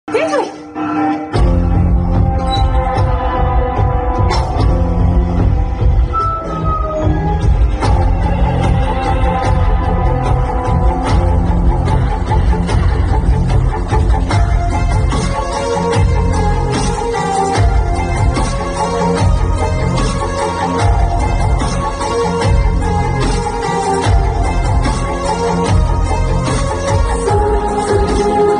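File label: climax thrilling bgm ringtone; yashoda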